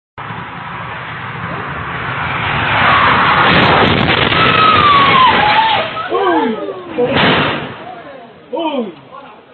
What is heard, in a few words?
Car Crash With Man